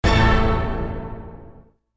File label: orch hit